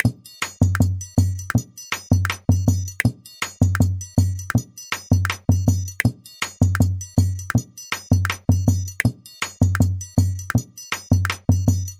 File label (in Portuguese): Xote